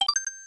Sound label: flower get; smw2